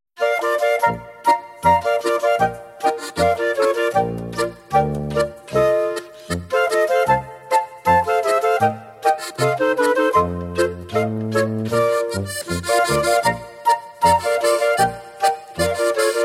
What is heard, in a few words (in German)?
Boarischer